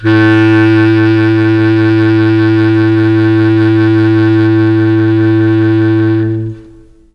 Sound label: A1 clarinet, mellotron